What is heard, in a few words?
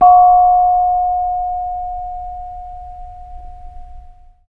BONANG F5; Spectrasonics; Heart of Asia